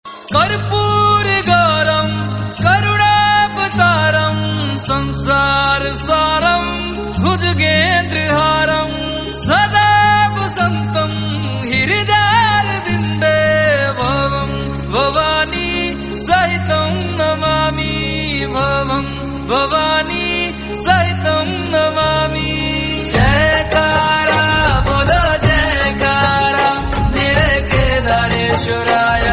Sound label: hindi devotional; jai jai kedara; mahadev